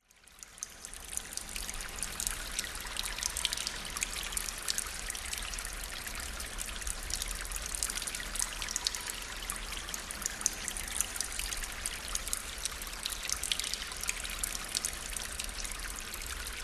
nature cave ambience water drip and flow close up distant drips wind howling; zapsplat